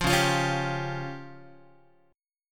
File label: C sharp Major Flat 5th, Guitar; C#Mb5 strummed; JGuitar